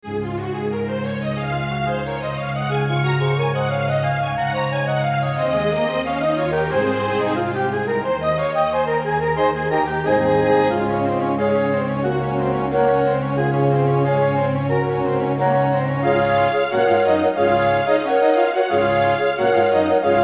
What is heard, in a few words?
Orchestral Version; FFVI